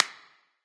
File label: mvt clap; serqet; smite